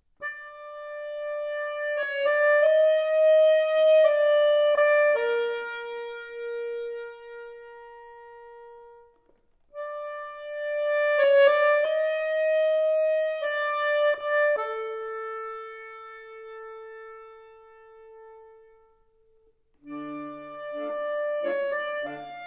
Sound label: Accordion